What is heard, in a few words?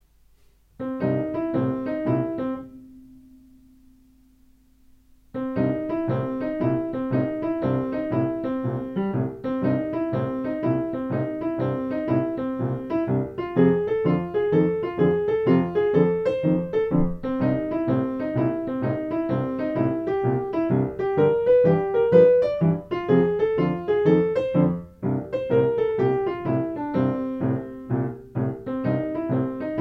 blues